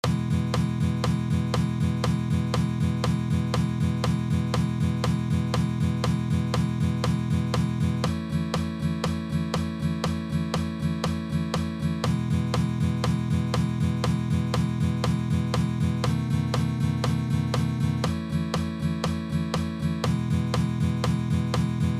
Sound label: Strumming 12 Bar Blues in E